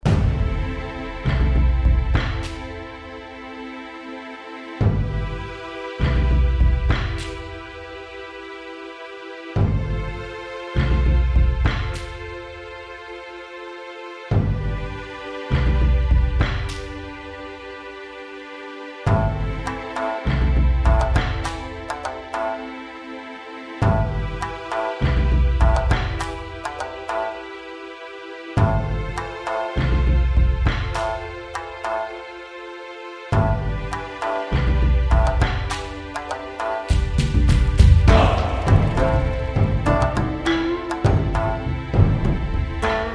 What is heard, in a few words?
gym drums